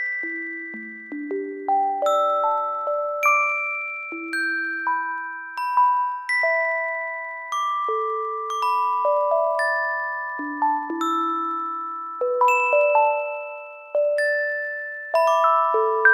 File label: iPhone 12 Pro Bird Song 2 Ringtone